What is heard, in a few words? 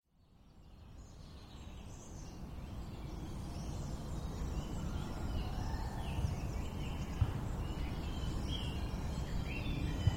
soundscape forest in frankfurt main at the 1st warm day